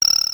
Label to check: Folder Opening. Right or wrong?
Right